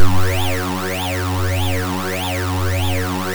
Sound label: 2600 F#1, ARP 2600; Hollywood Edge - Giorgio Moroder Rare Synthesizer Collection